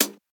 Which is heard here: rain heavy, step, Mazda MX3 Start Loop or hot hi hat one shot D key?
hot hi hat one shot D key